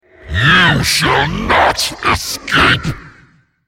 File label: attack; Greenterror; Character Voices - English